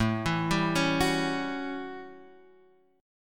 A 7th Add 6th; A7add6 arpeggiated; Guitar; JGuitar

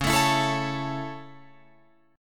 Db Minor Sharp 5th Db Guitar Open F; strummed; Dbm#5; JGuitar

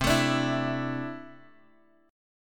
C 6th Add 9th C down Bass Standard; C6add9 strummed

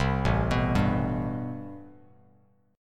A-Minor 9th A Bass Standard; arpeggiated; JGuitar

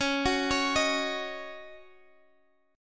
D sharp Minor 7th C sharp

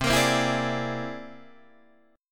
C Augmented 9th, Guitar, Open D

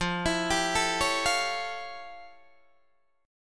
F Augmented Major 9th, Guitar, Open D

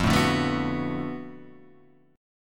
B Minor 7th F, chord; Bm7/F; strummed; JGuitar

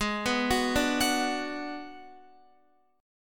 G sharp Minor 7th Flat 5th G sharp down Guitar; G#m7b5; arpeggiated; JGuitar

